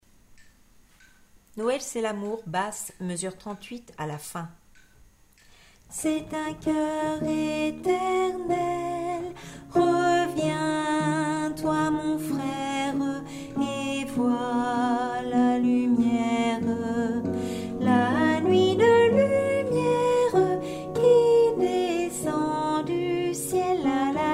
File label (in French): basse